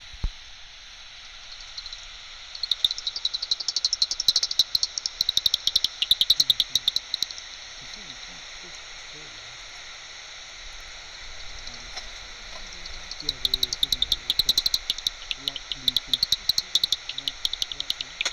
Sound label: bats